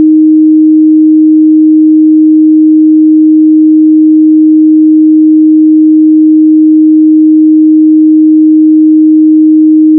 Tone D#

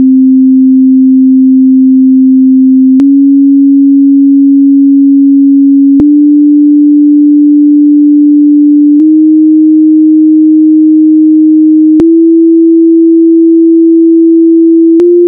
Scale

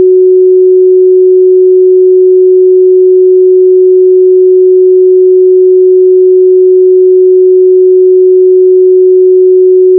Tone F#

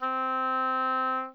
oboe mf C4

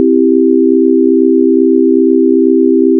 Chord C maj

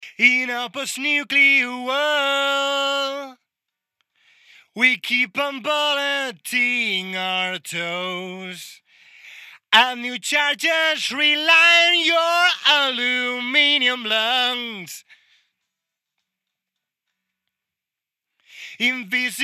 mono 2 binaural binaural expected; spacialization

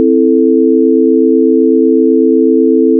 Chord A min

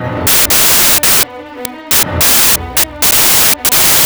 orchloop

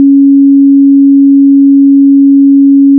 Short Tone C#

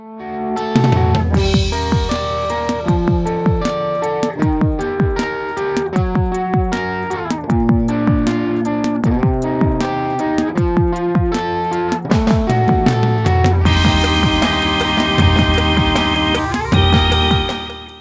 drums electronic guit

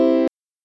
c chord sustain

